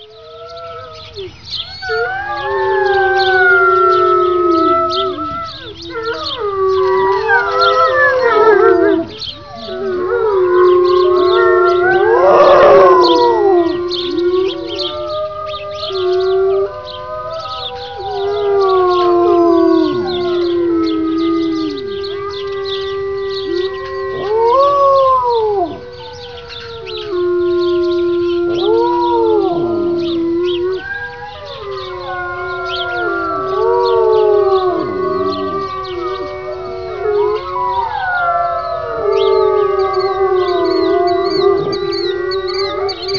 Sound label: Chorus howling wolves; Koshie and EastLake wolves